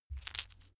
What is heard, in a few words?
stone gravel clatter soft; natur-tiere; steine